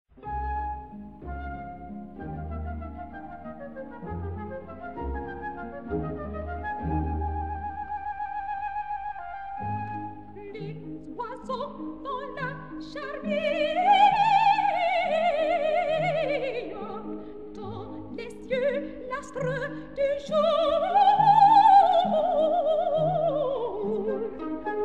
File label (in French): Les oiseaux dans la charmille soprano; Jacques OFFENBACH